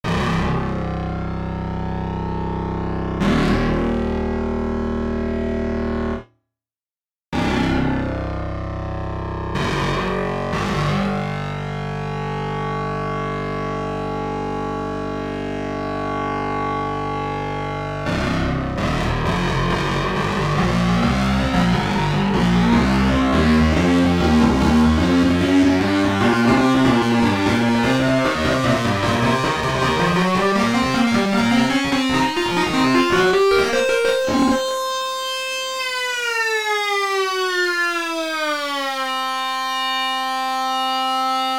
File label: Moog Brutal